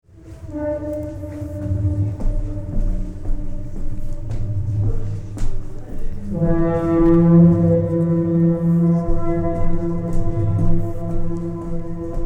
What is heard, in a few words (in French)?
sirene echos